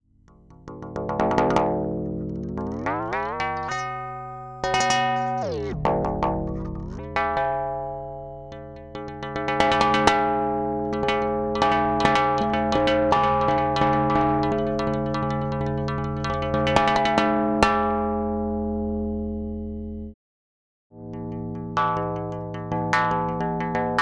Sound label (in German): Aodyo Anima Phy Physical Modelling Synth Piezo Mikrofon